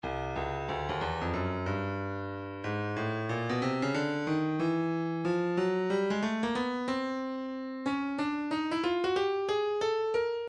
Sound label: breakbeat 092 bpm; Bandana C; Stylus Breakbeat Midi Files